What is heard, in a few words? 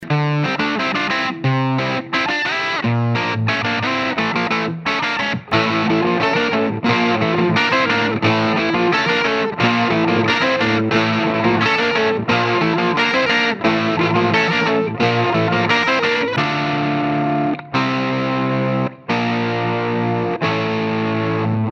guitar